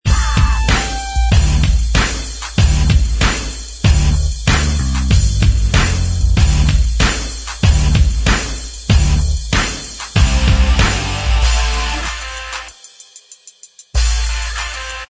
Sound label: Inst Track